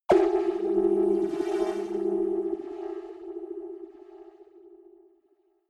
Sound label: creepy modulator short